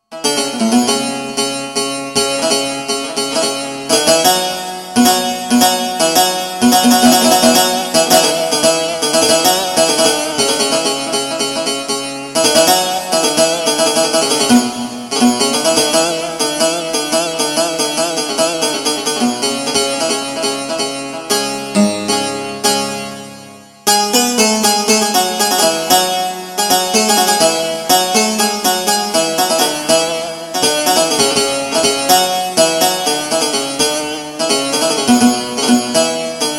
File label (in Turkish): GW8 kuru bağlama halk